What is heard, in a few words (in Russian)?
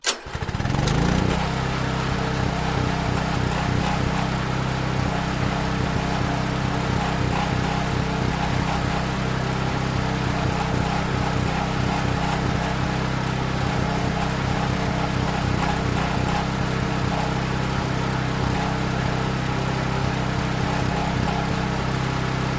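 генератор включается и работает 1 мин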